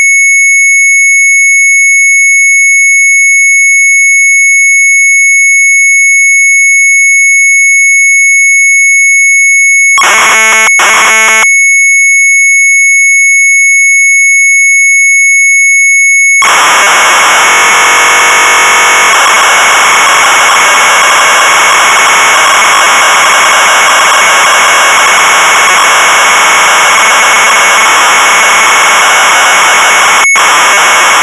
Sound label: MZ800 Tape; Sharp